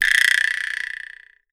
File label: vibraslap